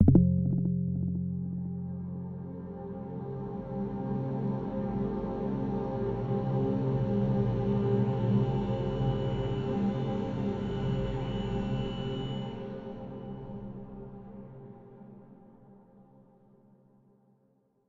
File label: Jingles